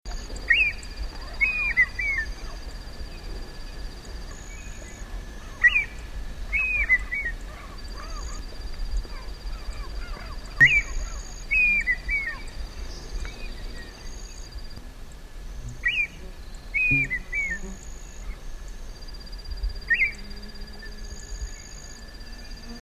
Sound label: Red-winged Tinamou; S. America